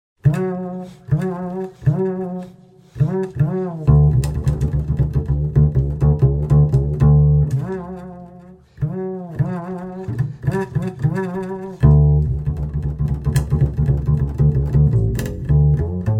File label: Bass Intro